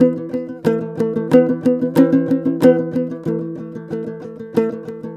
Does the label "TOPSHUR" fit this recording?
Yes